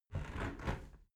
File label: window close soft